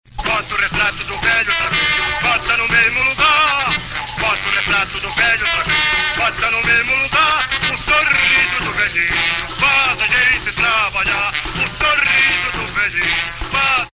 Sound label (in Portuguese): Radio Jingle; Getulio 1950